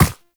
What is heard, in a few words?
Operator Run Ice, Footsteps; Warframe